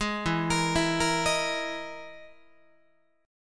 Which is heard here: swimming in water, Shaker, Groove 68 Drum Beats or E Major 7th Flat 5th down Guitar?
E Major 7th Flat 5th down Guitar